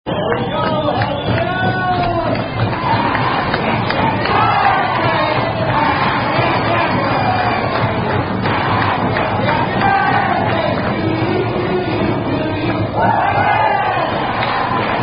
Happy Birthday Song, Happy Happy Birthday (Tune Battle Hymn Of The Republic (Chorus))